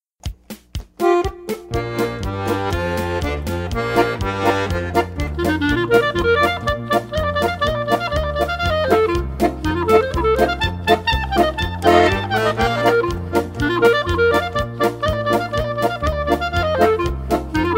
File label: Polka Band; Denver